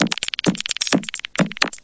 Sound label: 131 bpm, minimal transformer clicks, drum loops and breaks